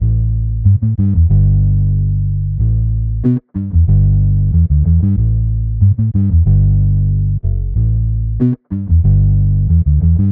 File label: Bpm 93 D Funk Bass; Basslines